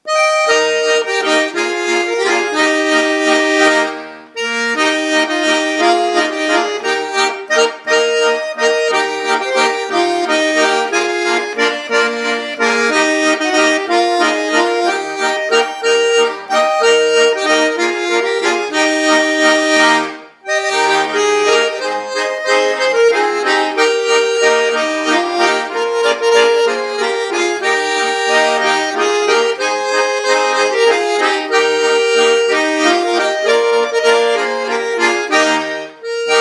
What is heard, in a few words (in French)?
valse frappe